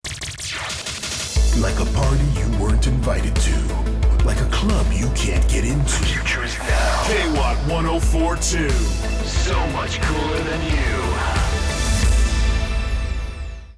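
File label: radio jingle; K-WATT 104.2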